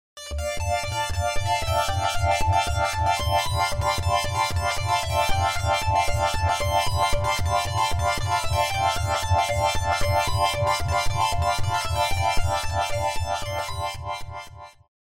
seq asteroids

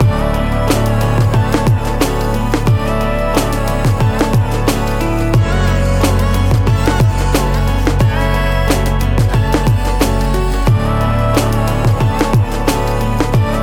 sad memories amaj; monosounds

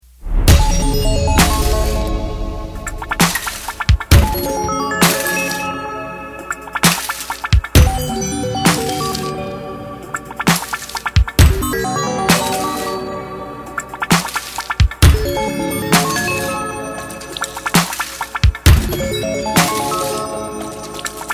granular rhythms

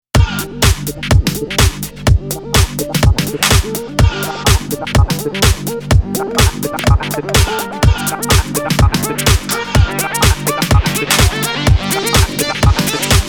bass cadets master

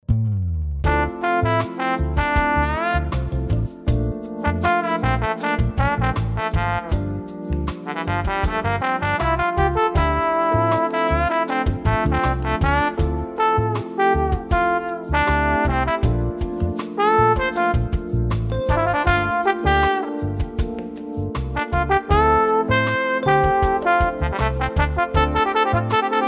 meditation

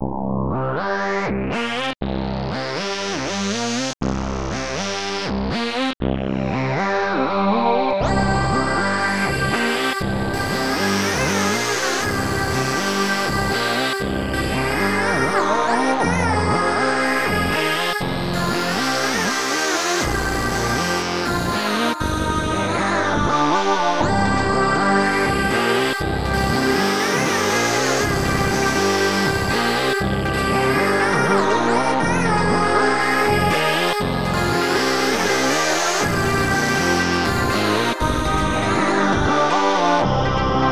harmonic distortion; fathom

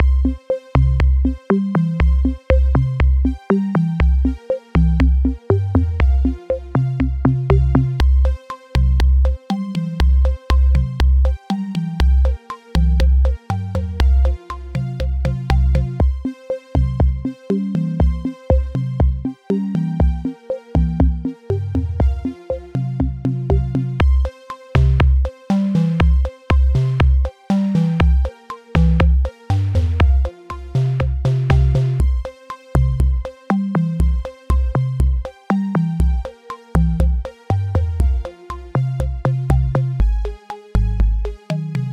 analog drums

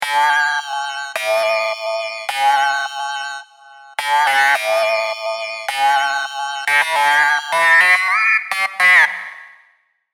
serum synths; chiqui audio

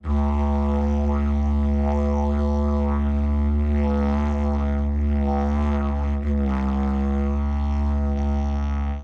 organic didgeridoo elongated

